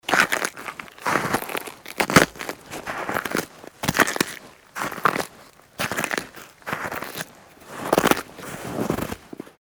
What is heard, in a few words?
steps in snow